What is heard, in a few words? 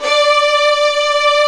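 strings, Vlns 8 fff; Roland L-CD702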